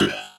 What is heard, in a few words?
screams android